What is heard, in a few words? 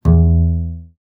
pizz